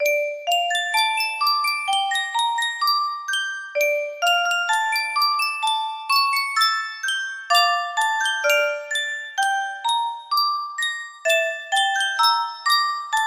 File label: music box; yunsheng custom tune